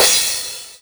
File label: Cymbals